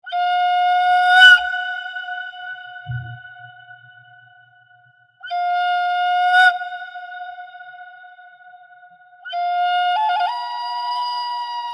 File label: flutemastr